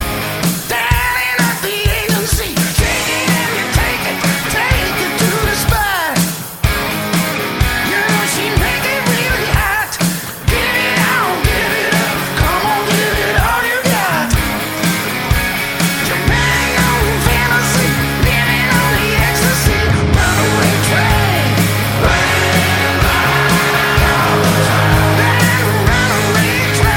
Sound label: rock male version; ringtone